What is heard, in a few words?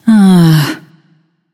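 nonverbal sigh; smite; voicepack; izanami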